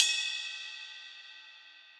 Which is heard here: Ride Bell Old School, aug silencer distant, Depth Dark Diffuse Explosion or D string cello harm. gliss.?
Ride Bell Old School